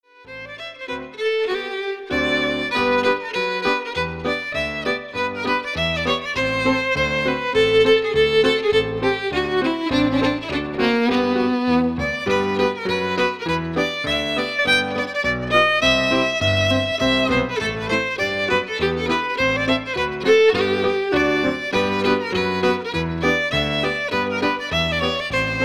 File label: Polka